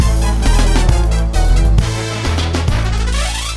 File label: CIRCUS